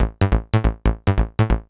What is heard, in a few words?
TECHNO BASS 140 A